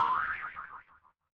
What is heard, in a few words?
cartoon boing